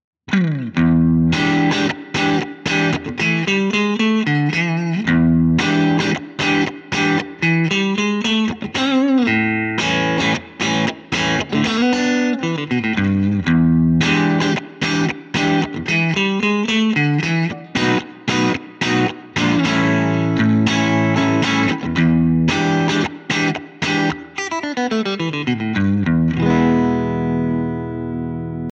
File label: breakup; jensentone